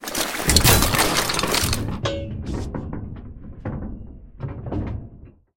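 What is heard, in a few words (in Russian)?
звук разваливающегося металлического сооружения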